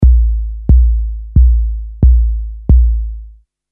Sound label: Basic Bass drum